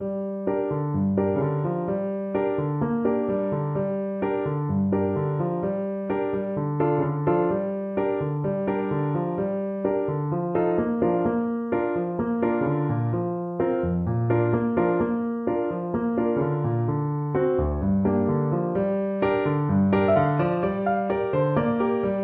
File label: chanukah, clarinet